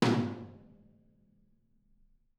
R B Rack Tom B Room; Sonic Foundry (Sony Creative Software) - Drums from the Big Room The Mixes; R&B One Shots

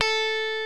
LES PAUL

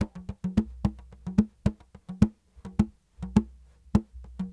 Sm Frame, World Percussion

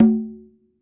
Conga Hit, Latin Percussion, One Shots; Sonic Foundry (Sony Creative Software); Joe Vitale Latin Percussion

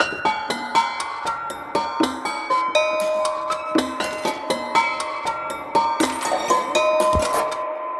Prepared Piano Loop

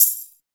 crisp tamb; POP-DNC 2

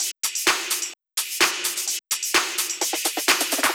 Drum Loop 128BPM Percussion; VEC5 Drumloops; VEC5 Multi Loops; Loop Elements

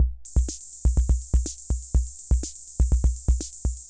Ace Tone Rhythm Fever Loop

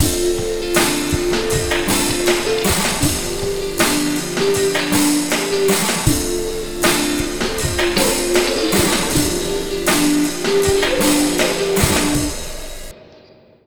Slo Mo Full, Lounge; Zero-G Kocktail Kollection; Construction Kitz (Lounge)

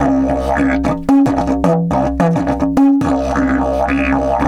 108, Didgeridoo

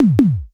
KLONE, KICK, Klone Dual-Percussion-Synthesiser; KLONE KICK NW8; 300 Drum Machines

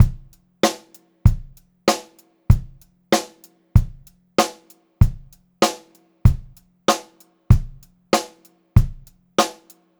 96 CL BEAT1, Pop-Rock Drumloops; USB Soundscan vol.37; AKAI; 90 s Sample CDs

